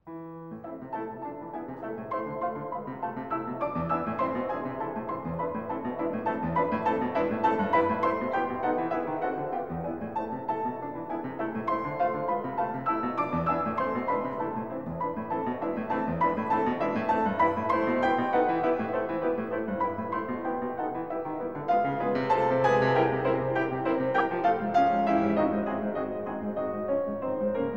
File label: Etude in A Minor; Chopin; The Complete Etudes; Opus 25